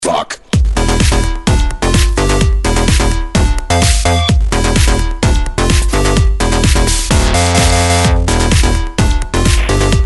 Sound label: win, music